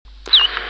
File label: call, iberian chiffchaff